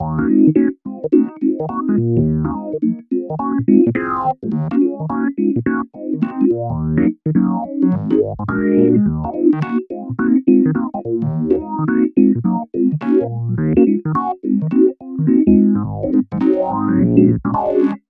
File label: epno orig hc